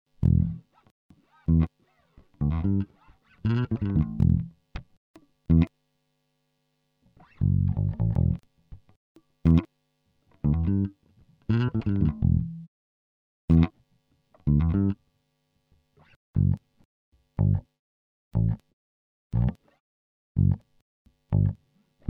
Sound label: Funky Bass